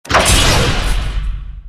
Fatman Launch; FoS SFX

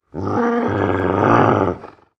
dog growl

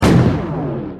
Laser Rifle; FoS weapon sounds

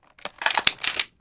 Wpn lightmachinegun jam

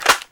Wpn shotgunsingle reload

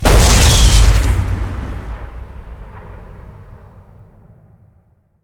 Wpn tesla cannon fire; Wpn teslacannon fire 2d

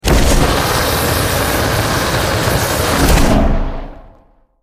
Plasma Thrower Shot; FoS

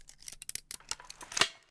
Wpn shotgunsingle jam, shotgun single jam

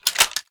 Wpn silenced 22 smg reload

